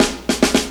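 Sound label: drum roll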